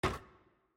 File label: Stuck Shaft; Puzzle; Monkey